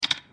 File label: key press